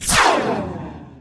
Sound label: dolomedes attack